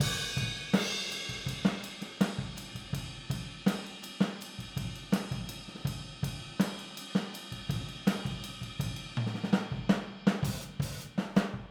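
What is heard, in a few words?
Boogaloo Fill Overhead stereo, 82 BPM; Sonic Foundry; Drums from the Big Room The Mixes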